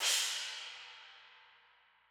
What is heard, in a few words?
S CRASH HIGH CRASH, drum; Sonimus; s series drum pack; STONEQ 4K; SATSON; SATSON CS